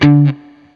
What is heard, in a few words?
BLU PICK, Guitar & Bass